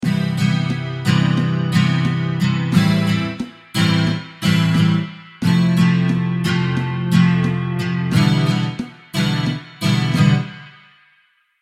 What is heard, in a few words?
strumming pattern